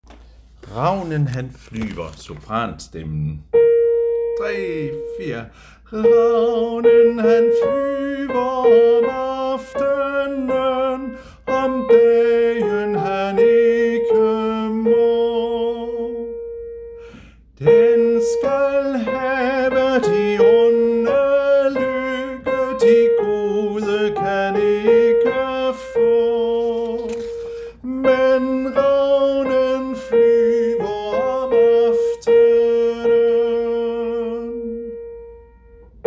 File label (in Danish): sopran; harlev koret; Ravnen